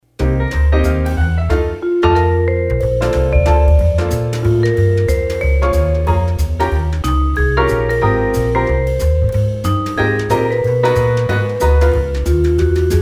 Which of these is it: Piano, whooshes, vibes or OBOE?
vibes